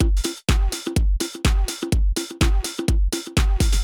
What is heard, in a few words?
drum loop house 125; eJay